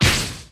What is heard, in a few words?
Ball Sub Hit; gamerip